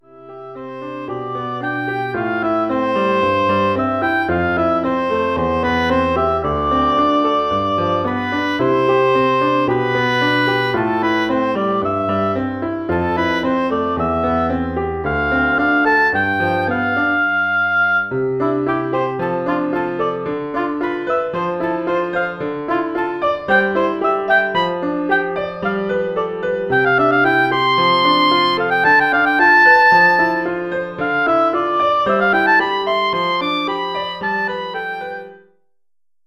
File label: oboe solo + PA